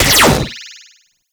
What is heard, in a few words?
Spectra Fire 3D, weapons